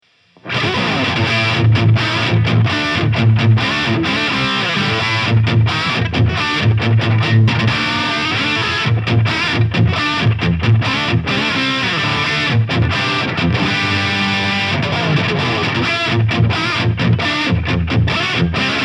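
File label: Schecter KM-6 MK-III Lead, guitar; Keith Merrow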